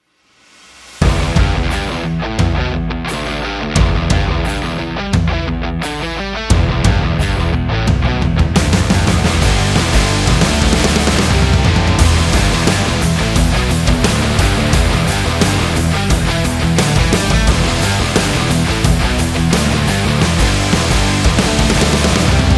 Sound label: Rock Star